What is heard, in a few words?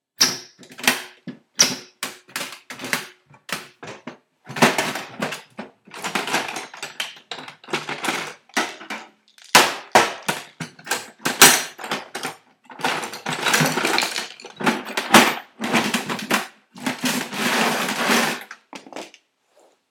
Tool Box